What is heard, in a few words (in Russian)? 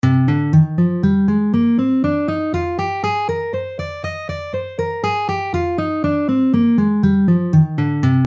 Gamma Mi bemol mazhor 4 pozitsiya; gitare